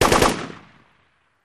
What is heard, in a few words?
Machine Gun Sngl Rap, single burst